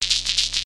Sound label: Vibration; molecules-and-light